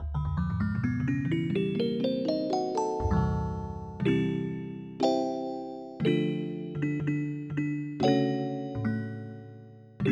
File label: Music Box